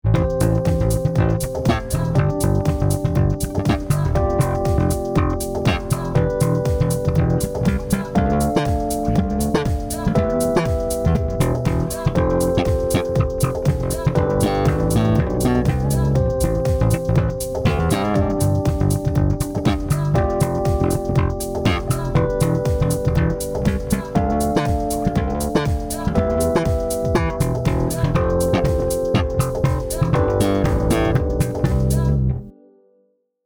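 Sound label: Tone Thumpin, bass; Yamaha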